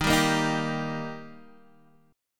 D sharp Major D sharp Guitar Half Step Down, chord